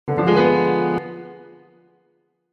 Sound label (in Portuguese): som de medo piano